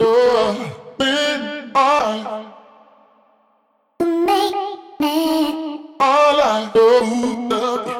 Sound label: Hold Up